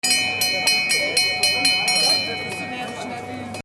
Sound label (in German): Schiffsglocke klein